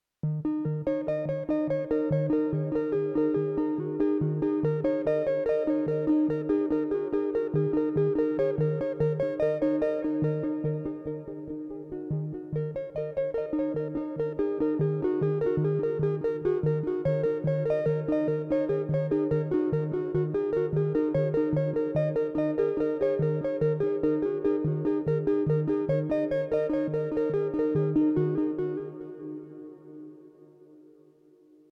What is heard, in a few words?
Seq pseudo guitar